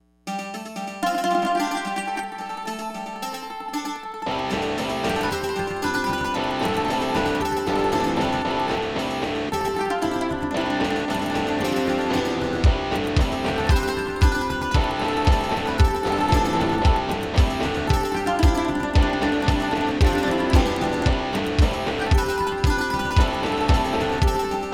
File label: Mandolin song